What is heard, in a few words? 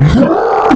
were leap roar; eoti